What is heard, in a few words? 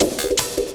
Beat, Drums